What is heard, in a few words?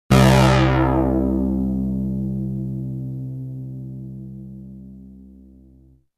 synthlead